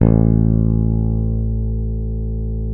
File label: P BASS SUSTN, A1; Sampleheads; Will Lee Bass Library